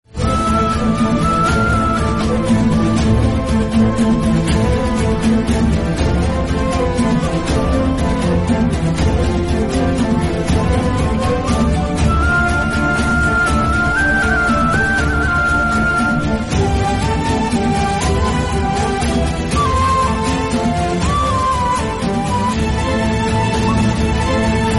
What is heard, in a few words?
Turkish drama tone ringtone